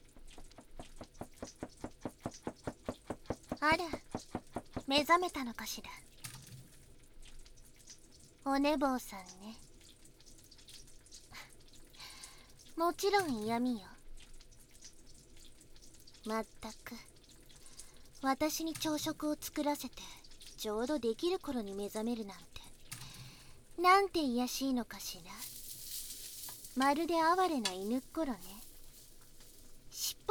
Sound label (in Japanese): 耳かき, ASMR; これからの二人